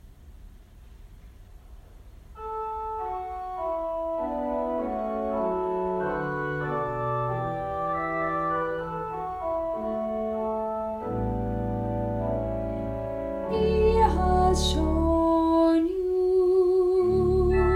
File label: soprano track